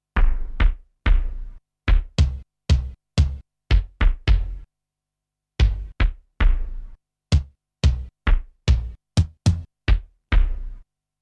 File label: Kick